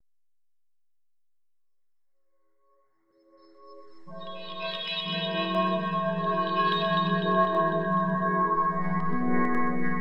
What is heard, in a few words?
F Harmonic Minor 60, Deconstruction